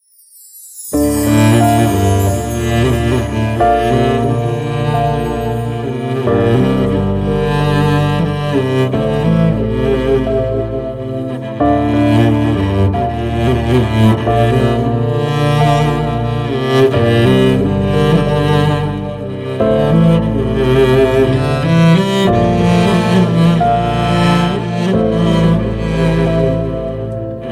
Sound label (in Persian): ahange bi kalame; turkiey; آهنگ های بی کلام گیتار ترکیه ای